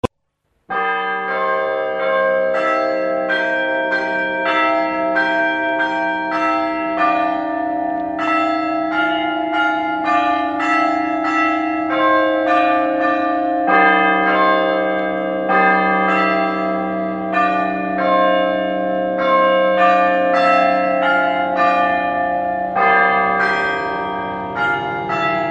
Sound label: glockenspiel; fatima